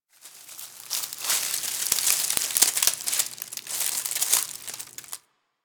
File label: Derelict Tree Crackle; Warframe; Orokin Derelict